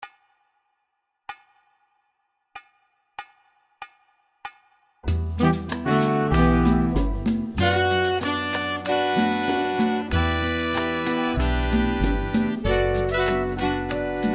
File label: Meditation